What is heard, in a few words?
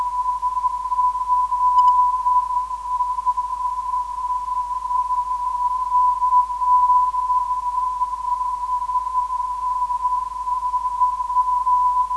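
non-directional beacon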